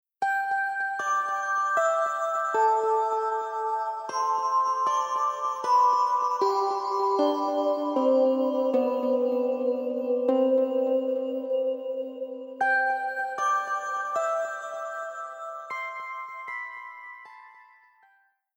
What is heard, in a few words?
Future Bass Melo Celesta